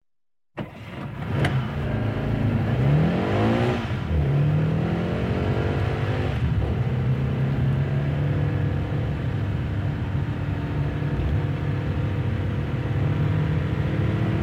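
sports car sound, gear shifting, recorded in the cabin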